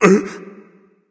surprised, voice; Smite; ymir skin12

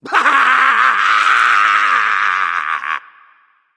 battle cry; smite; voicepack; loki

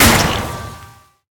hit silence; smite; thanatos

